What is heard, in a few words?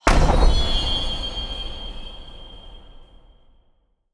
medium win